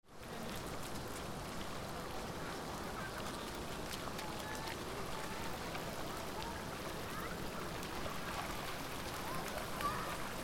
village of 4 seasons

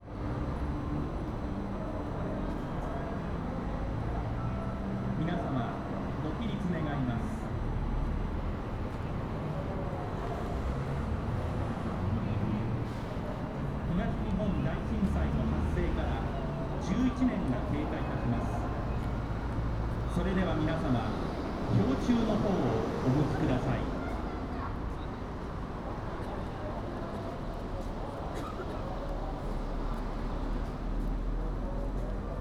fukushima station square

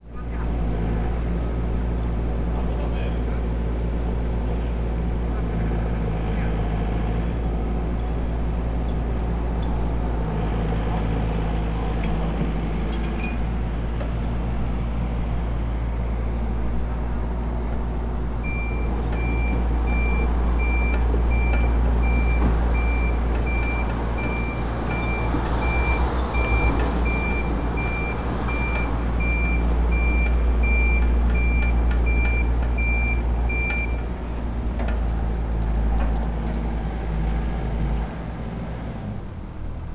kanaya station

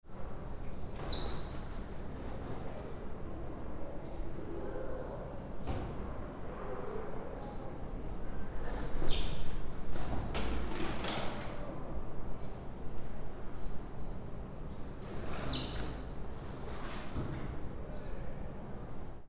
sparrow; 雀; 研究室脇の階段室にやってきた

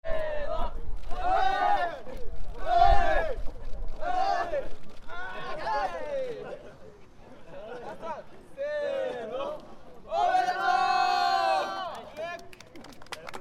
goukaku happyo; 合格発表; 大学入試の合格者発表がネット上でも同時にされるためか，近年，発表を見に来た人の中で，落胆している人，泣いている人はあまり見かけない．掲示板での発表は，受かる自信がある人と大学生協学生スタッフの / パフォーマンスの場 / として存続しているかのようだ．

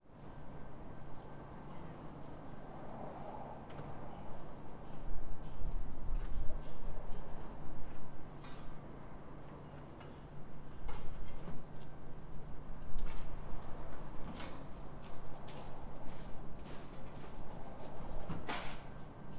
bunkadori

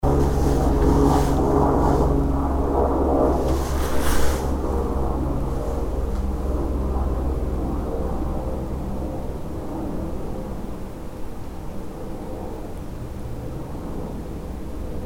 helicopter

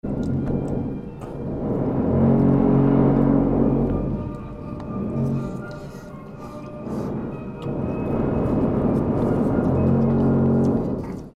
restaurant

